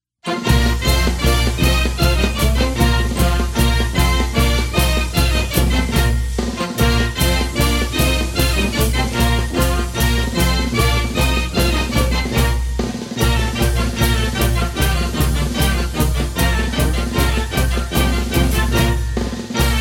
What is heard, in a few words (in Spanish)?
marcha de trote, marchas instrumentales; himnos de caballeria